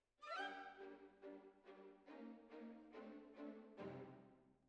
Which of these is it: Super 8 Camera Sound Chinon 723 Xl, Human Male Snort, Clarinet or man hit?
Clarinet